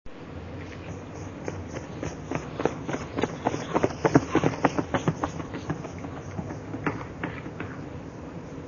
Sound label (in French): pas bruit de course